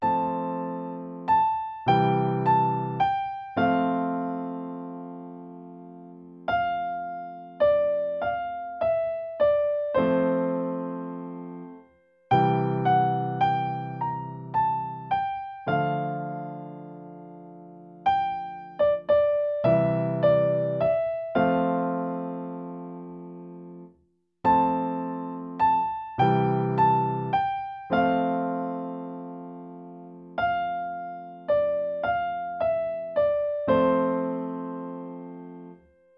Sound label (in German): Leise rieselt der Schnee, Klavier